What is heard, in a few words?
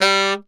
Tenor Sax Portato G2; DirectWave; Varazdin Orchestral